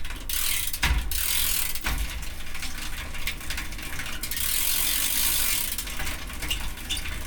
BICYCLE; 1000fx

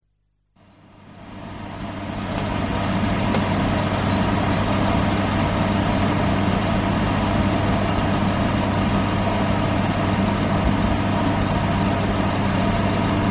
Ladies' Hairdresser Large Hair Dryer; SOUND EFFECTS1

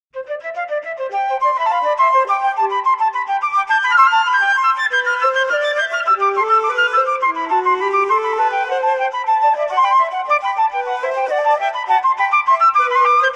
2 part Invention on 2 flutes; Andy Findon; Bach and Telemann; Johann Sebastian Bach

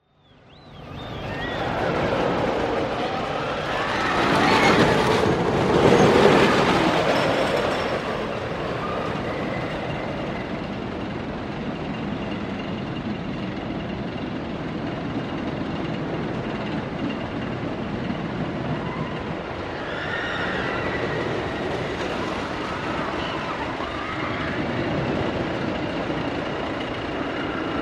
Roller Coaster; SOUND EFFECTS1

